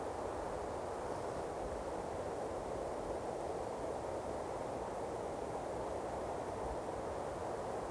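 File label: ambience Wind Light; Star Wars Obi Wan; XBOX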